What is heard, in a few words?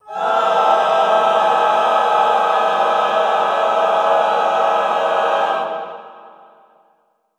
classical choir, cluster high; Best Service; Extended Classical Choir